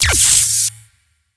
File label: Magic Lightning Zap